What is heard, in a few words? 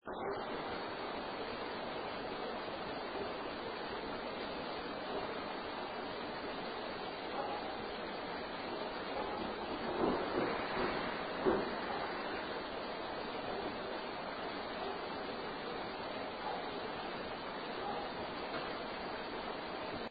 footste; Fuel Building; 2nd Floor; Art Room; footsteps